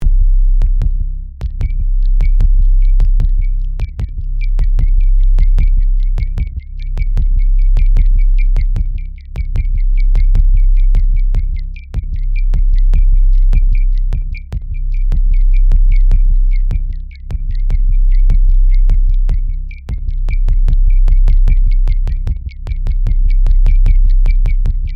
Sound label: Bass DRUM; FutureWorld